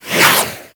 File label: Boomerang Whoosh